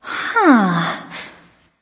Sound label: confused; daji